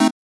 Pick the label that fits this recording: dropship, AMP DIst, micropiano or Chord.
Chord